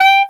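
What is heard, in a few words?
STAC G4, Clarinet Staccato; DirectWave; Varazdin Orchestral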